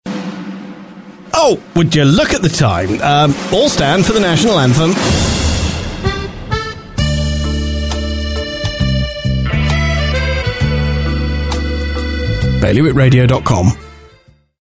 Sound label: jersey national anthem